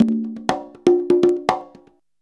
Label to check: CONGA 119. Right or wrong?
Right